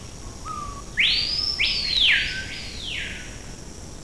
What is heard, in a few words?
screaming piha